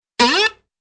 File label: quick spring